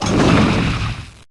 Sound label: Catapult Death Sound; Heroes3; War Machine